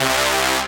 Synths Root C; Synth Shots and Basses; Vengeance - Essential Clubsounds Vol.4; Note C

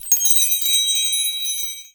wind chime; AKAI S-Series CD-ROM Sound Library VOL-5

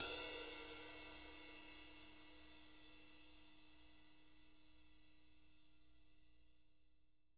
JZ RIDES, Living Drums

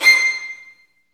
STR VN, Roland, STR Vls Marc; Roland L-CD702; Vlns Marc1-3

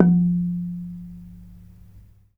RANAT THUN F3; Spectrasonics - Heart of Asia CD2

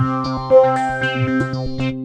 T-WAVE, Synth Waves

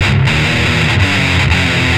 Riff, Industrial; Nasty

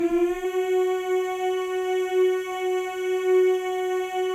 male; Spectrasonics; Symphony of Voices

